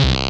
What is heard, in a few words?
SYN, DANCE SYNTH